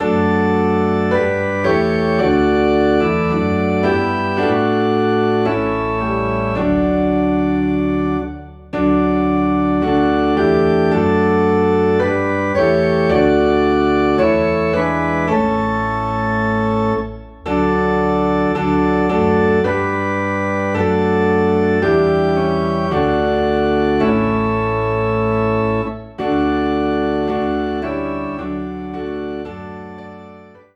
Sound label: Piano and Organ in D; Jesus Shall Reign